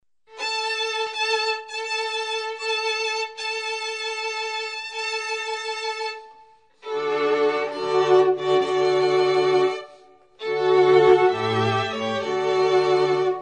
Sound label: Bridal Chorus, string quartet; Wagner